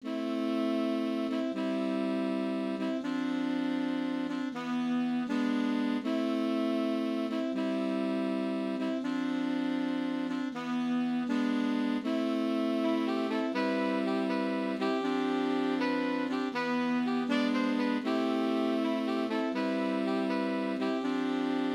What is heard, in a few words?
Medieval Brass, MIDI